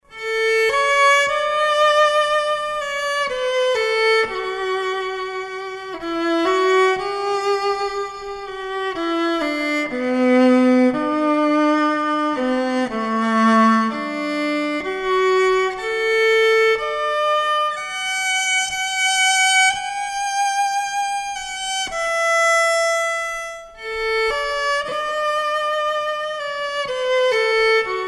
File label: acoustic; lrb v55